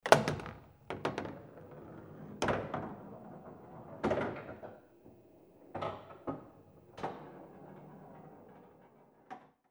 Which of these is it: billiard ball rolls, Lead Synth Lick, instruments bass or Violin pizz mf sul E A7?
billiard ball rolls